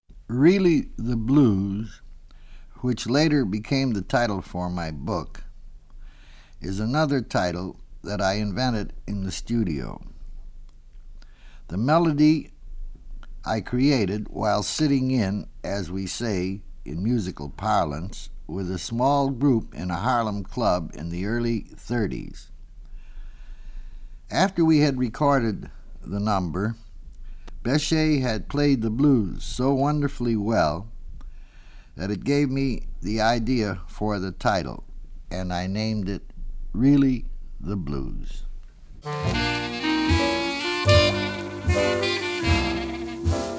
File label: BLUES, QUINTET